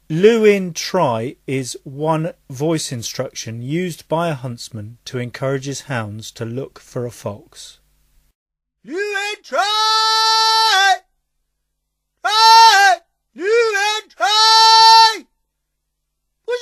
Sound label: LOO IN TRY, calls